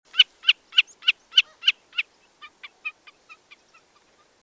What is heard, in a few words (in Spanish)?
agachadiza comun